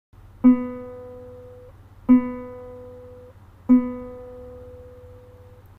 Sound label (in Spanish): Nota SI, cuatro